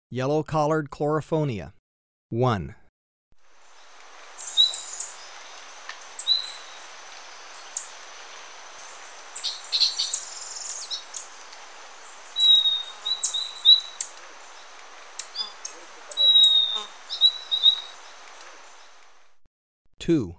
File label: Yellow-collared Chlorophonia, Birds of Ecuador; S. America